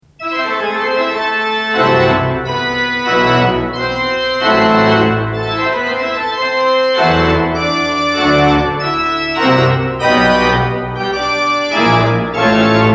French Organ Music; vierne

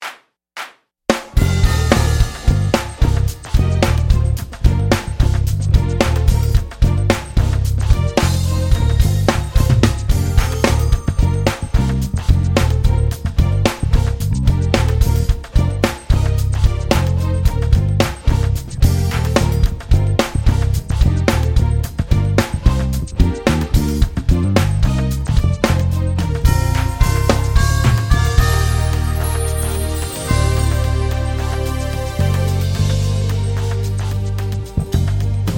piano play along instrumental accompaniment

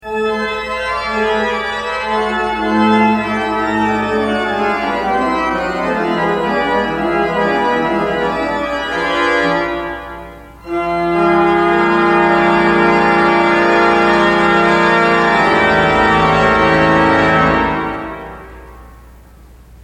French Organ Music a minor chorale; franck

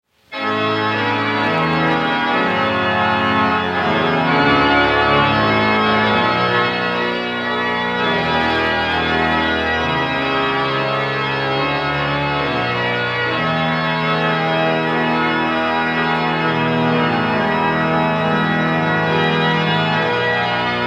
Organ Master Works, mendelssohn

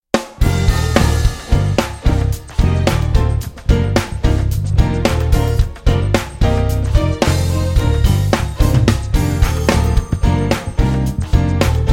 instrumental accompaniment

